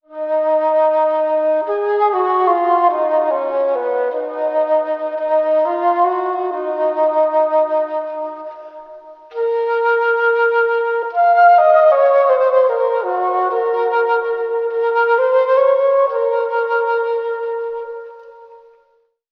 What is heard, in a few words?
flute, Sad